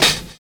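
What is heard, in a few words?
hi-hat; USB Soundscan vol.46; AKAI; CYMBALS; 70 s Breakbeats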